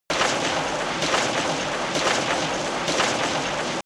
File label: industry machine mechanic dense